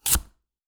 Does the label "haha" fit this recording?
No